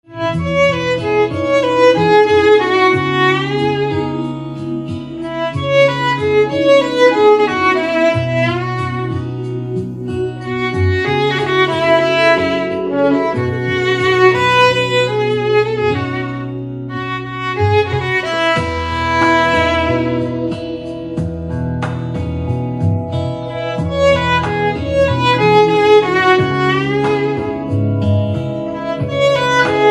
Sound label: Violin